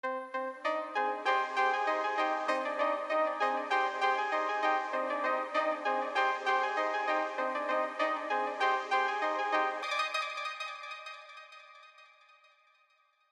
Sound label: 196 BPM C minor